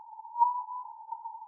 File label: filtered call